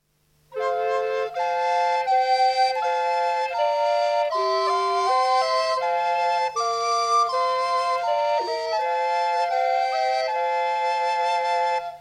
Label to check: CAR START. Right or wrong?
Wrong